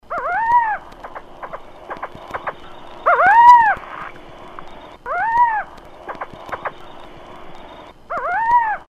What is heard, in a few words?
Courtship, burrowing owl